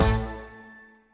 Near Win Reel Bounce; netent

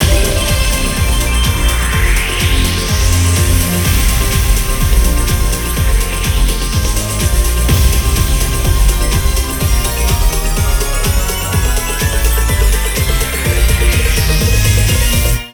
big win

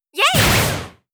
Light Attack; netent

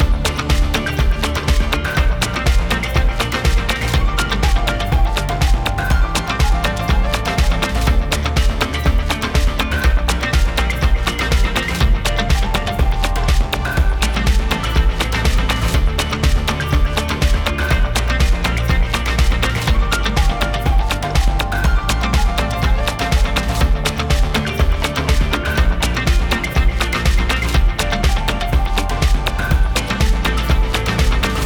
Free Spins Music; fruitblaze; netent

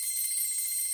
count up loop; netent

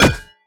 monolith end; netent

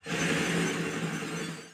heartbeat; netent

